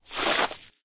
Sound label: victory beard comb; smite; odin